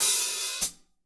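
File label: hat open close, drum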